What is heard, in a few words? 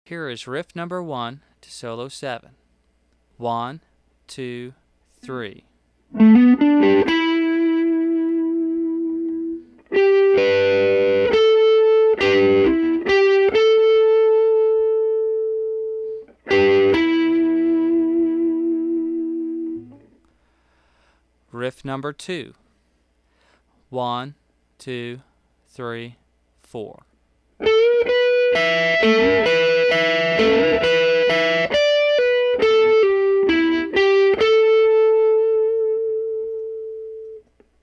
Pull Offs Riffs